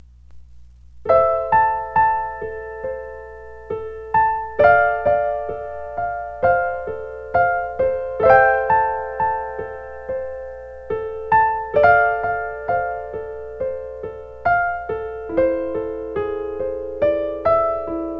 piano

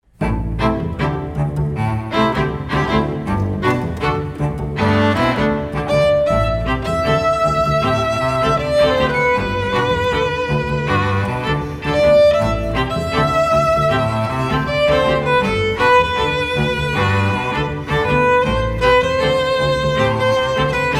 string quartet

